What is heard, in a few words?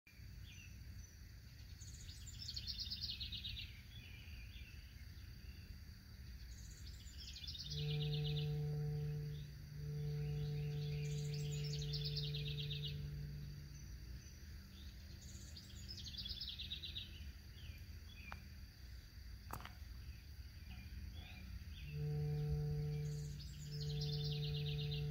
Gas meter; کنتور گاز